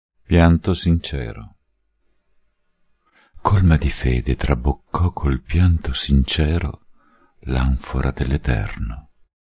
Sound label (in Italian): pianto sincero